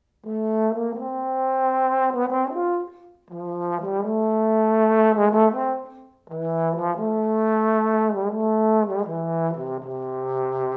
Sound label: melodious etudes